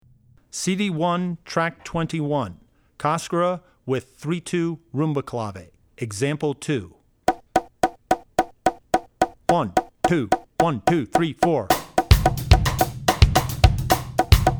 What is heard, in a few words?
Cascara (2-3 Rumba); Drummer's Bible Tracks